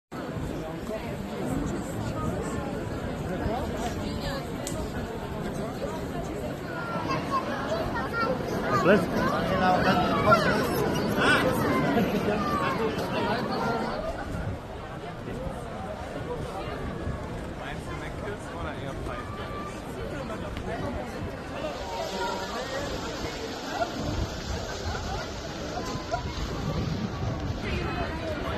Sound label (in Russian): atmosfernye zvuki strasburga